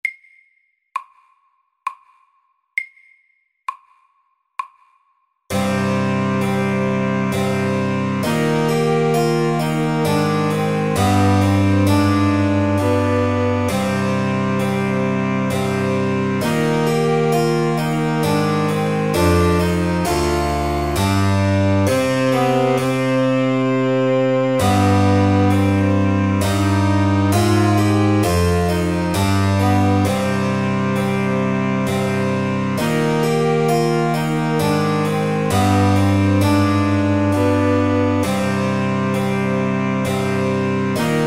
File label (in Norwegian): Menuett SAKTE